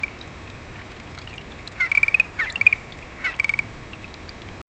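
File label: ducks teasing quack echoing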